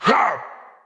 spirit attack; FlyForInsanity

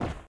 spirit death; FlyForInsanity

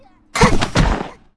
red harpy die; Npc Ship; FlyForInsanity

